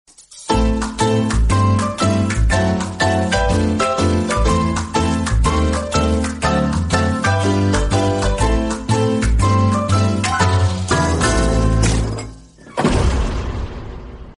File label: funny movie theme ringtone